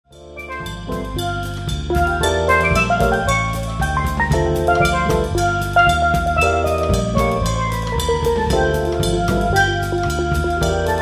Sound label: quartet